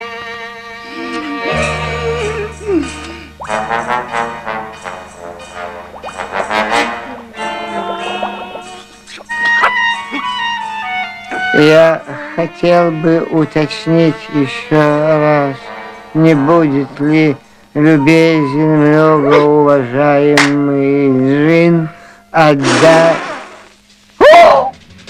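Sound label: pavlin, peacock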